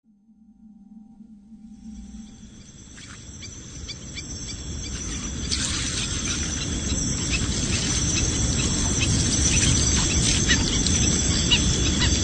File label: Santa Rosa National Park; Chris Watson